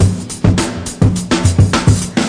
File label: 105 swing, drum loops and breaks